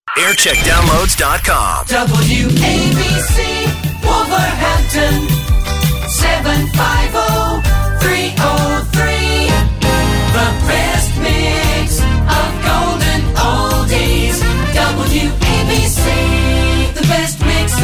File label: Jingle Montage; WABC; jam